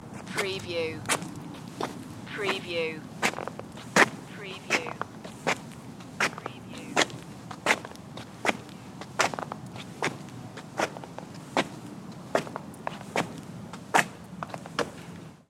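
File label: Sneakers Concrete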